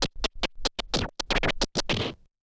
OLDSCHOOL SCRATCH 96

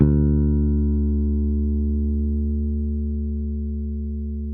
BASS D 2, ELECTRIC BASS

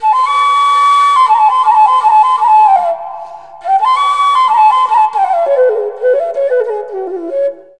FLUTE MELODY, ETHNIC STUFF

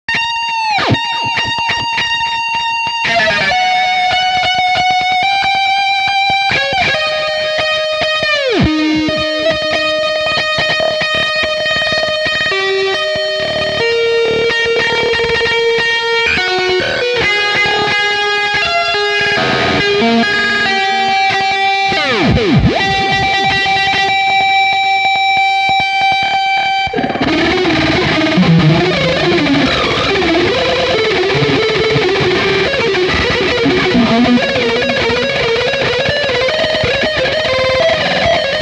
Guitar Solo

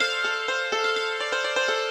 ethno, 125, A

